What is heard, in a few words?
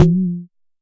Jomox MBrane, drum machines; Jomox MBrane 11